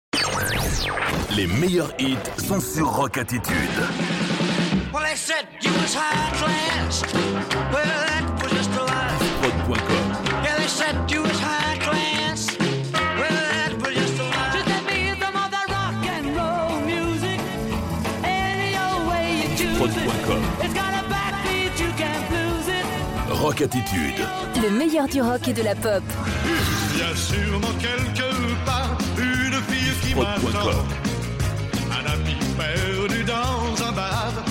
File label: Rock Attitude Medley 88 BPM